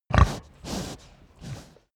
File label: pig grunt breath